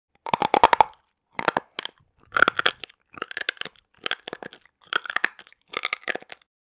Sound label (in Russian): gryzet zvuk